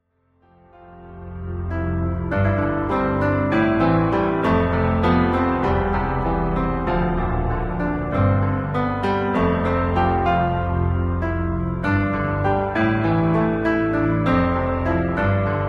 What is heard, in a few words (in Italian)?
musica